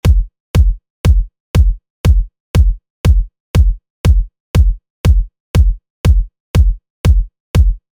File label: EDM DRY